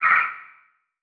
Multimedia Internet CD-Rom Flash Buttons Short

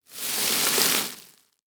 Foley Plastic Grass Crunch

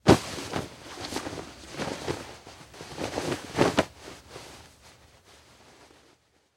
Impact Human Grab Coat And Rustles It

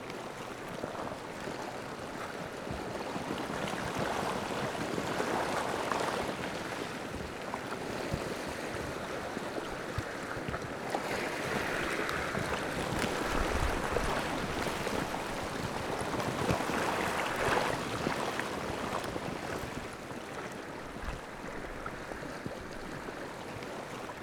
Just Water; Detunized; Reddevitzer Hoeft; GDC Game Audio Bundle

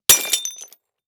Impact Ax Hit Ceramic; Impacts

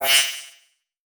Multimedia Internet CD-Rom Flash Beep Mechanical

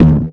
Multimedia Internet CD-Rom Flash Buttons Hard

